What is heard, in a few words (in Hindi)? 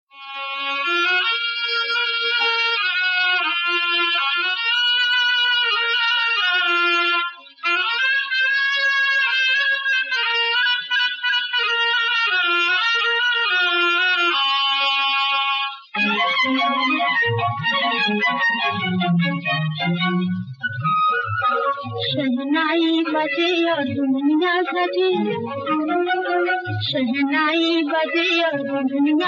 Shehnai Baje